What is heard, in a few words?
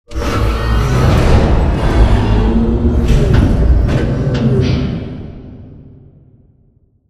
Lower Arm House Place; Boomtown; Sideways Town; Second House; Titanfall 2